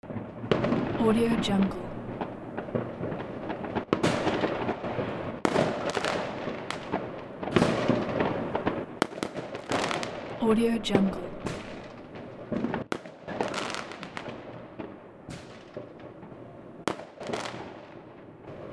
new year fireworks ambience

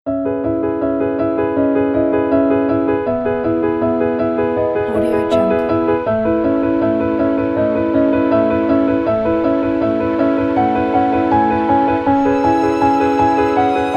fly piano

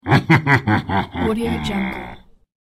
short evil laugh

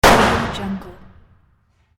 shotgun shot single shot interior more mid

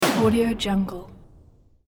bounce

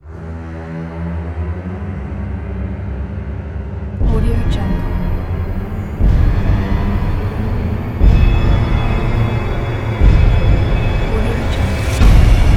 horror riser

